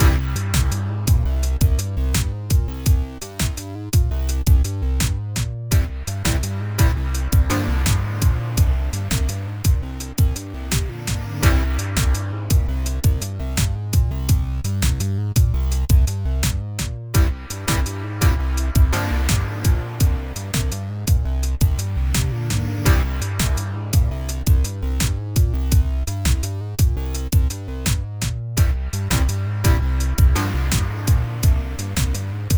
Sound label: Electro Sketch; Ms. Germinator; PS Vita; PS3